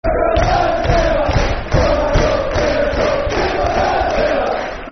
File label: Blues Go Down Together Football Chants (Soccer Song); Birmingham City Bcfc